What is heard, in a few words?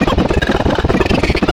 giggling running hard; itsteals; legs; slender